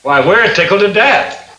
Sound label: tickled